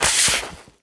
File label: paper fall; Golden Crayon Sounds